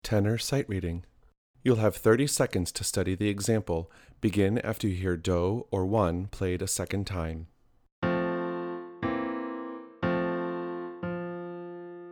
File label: Tenor in F